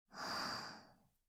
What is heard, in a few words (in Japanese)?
ふぅ…